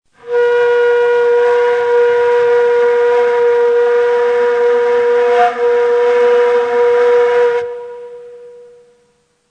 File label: Zirgule, Ney